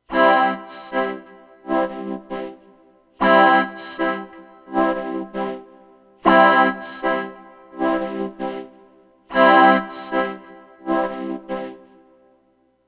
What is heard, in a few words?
hard sync; analog synth